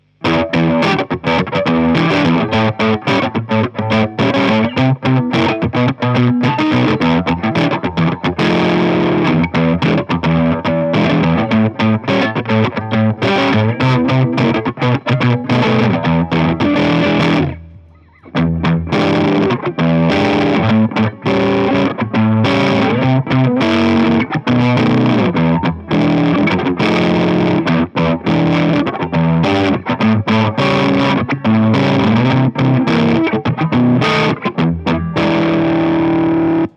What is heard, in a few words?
od 335 kaula bd2 od3; dsl401